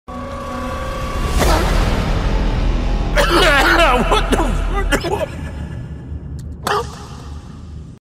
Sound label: COUGH what the frick